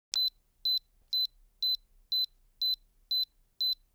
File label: Alarm